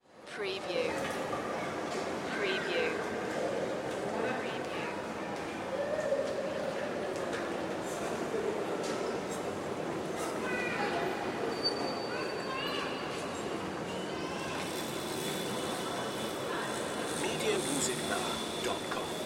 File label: Airport Crowd Walla; sound effects bible